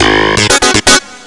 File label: funk; Terra Sound Library; construction set